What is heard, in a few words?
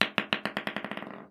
Snooker Ball Bounce; Balls